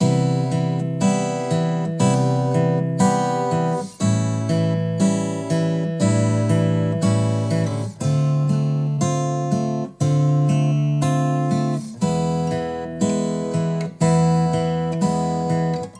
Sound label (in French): Harmonie Boucle Min Harmonique, guitare